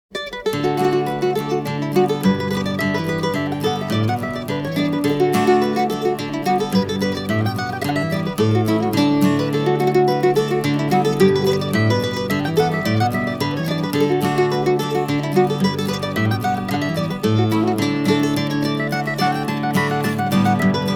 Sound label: Reel On Mandolin, Irish Folk